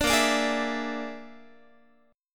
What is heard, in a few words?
Bb 7th Flat 5th Bb, Guitar; Bb7b5 strummed